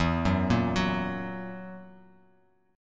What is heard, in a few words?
E Suspended 2nd Flat 5th, Bass Standard, chord; arpeggiated; JGuitar